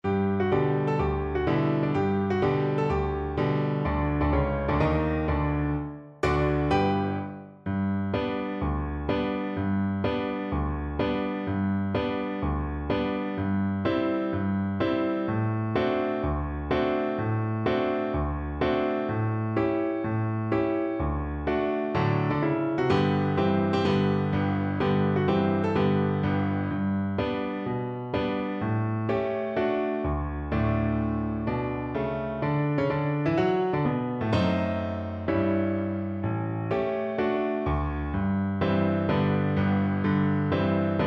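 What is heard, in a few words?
VLC karaoke, Cello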